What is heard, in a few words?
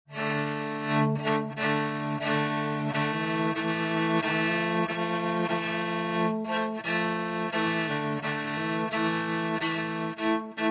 bard tagelharpa; assassin s creed valhalla